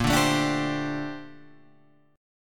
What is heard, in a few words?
Bb Major 13th Bb; strummed; JGuitar